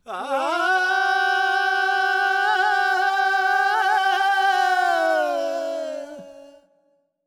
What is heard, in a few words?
Bhangra, Vocals Male, World Pop; Sonic Foundry (Sony Creative Software)